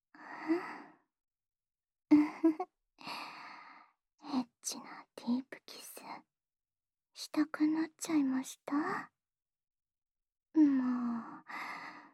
オナサポ用唾液少なめのディープキス

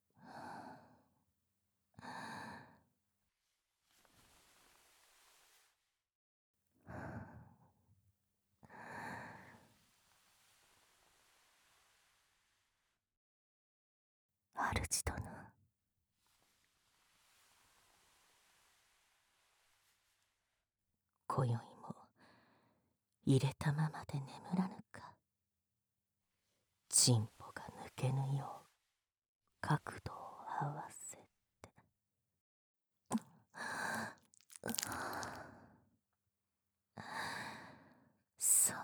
たっぷり耳舐め&まったり添い寝ハメ; 魔王の庇護の元、心安らかに…お眠り

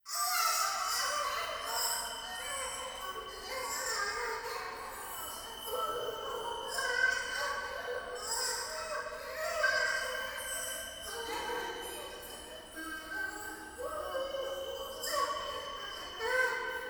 トイレ シャブ喘ぎ 山奥

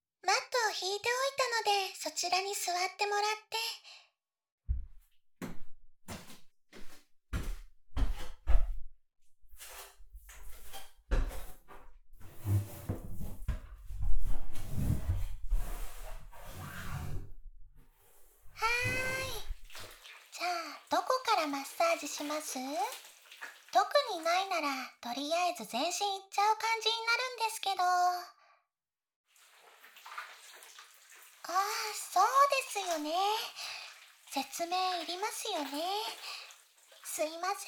目と肩のマッサージ, ASMR

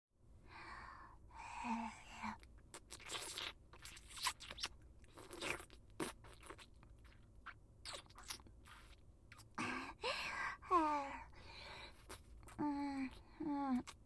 フェラチオ; SEあり

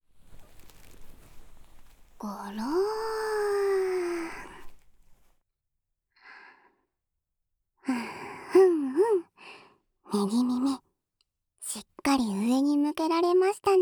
お導き音叉スパイラル（右耳）

